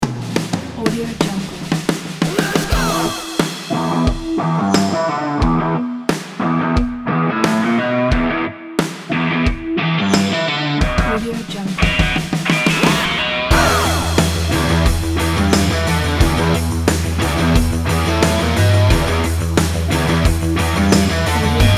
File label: energy indie rock